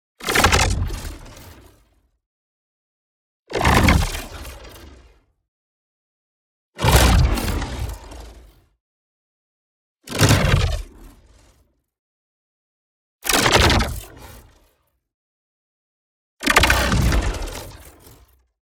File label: IMPACT MEDIUM Rippling Sword; boom library; Matter